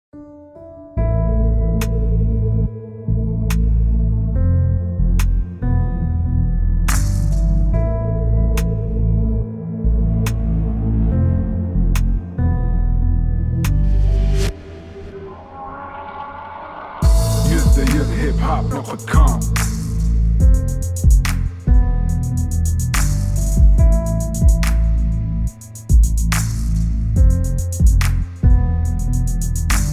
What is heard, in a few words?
Beat minus